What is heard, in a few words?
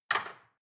dishwasher cutlery place soft